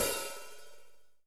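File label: jungle open hh, jungle open hi-hat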